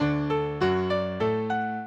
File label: minuet, mozart